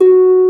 Harp F#3; Mo' Phatt; Digital Sound Factory Mo' Phatt

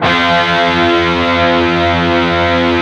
PRS PC F#3, PRS PWR CHRD, Guitars

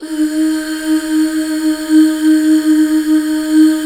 UUHH, Back Uuh Fem

